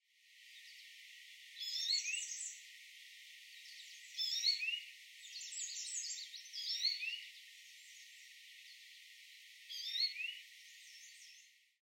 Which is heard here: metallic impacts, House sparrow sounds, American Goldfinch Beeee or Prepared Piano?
American Goldfinch Beeee